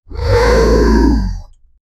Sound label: a short fast monster attack